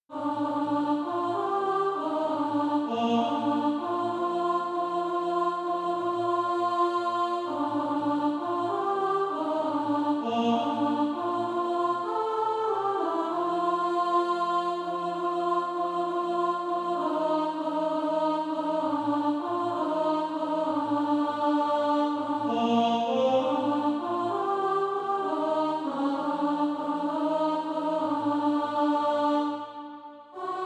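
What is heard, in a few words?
alto, SATB Practise Music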